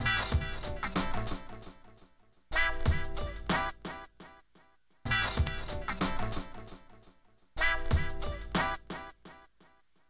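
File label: guitar, 95, Hip Hop and R&B; Best Service ProSamples vol.02; AKAI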